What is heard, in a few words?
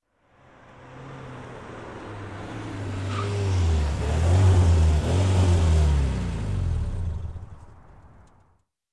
Car approaches and stops